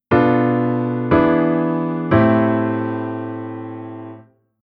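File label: Chord Secondary Dominant RelIItoV7ofVI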